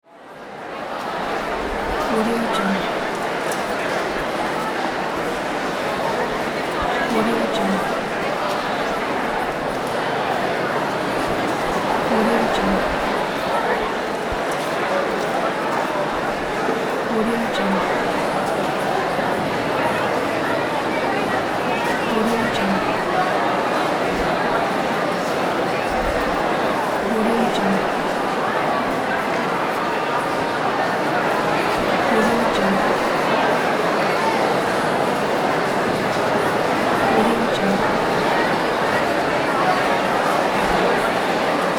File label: crowd talking